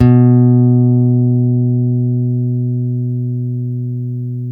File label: STEEL, Guitars